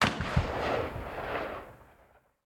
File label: bullethit far; War Thunder